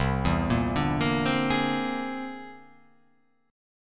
C Augmented 9th

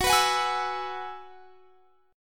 F sharp 9th Suspended 4th F sharp, chord; F#9sus4 Chord; strummed

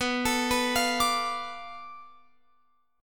B-Minor 7th Flat 5th B down Guitar Open F; Bm7b5 arpeggiated

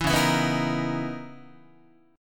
Db Minor 13th Db Guitar; Dbm13 strummed; JGuitar

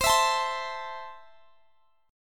C 6th C down Guitar Standard; strummed; capo=2

